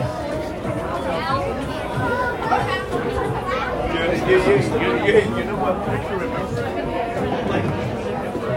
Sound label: restaurant